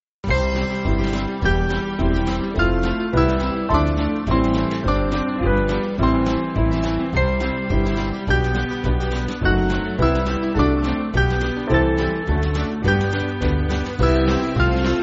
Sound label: Band, Free Music Backing Files for Churches; Hail Thee Spirit; Cowley